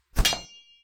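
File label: Weapon Generic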